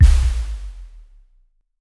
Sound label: impact boom tail